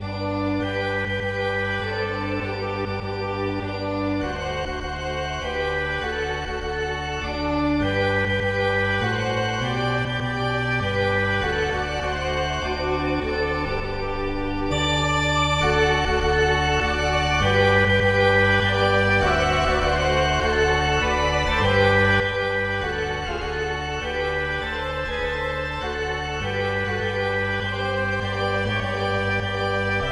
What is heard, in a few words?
polyinstru; hymns; Himnario grande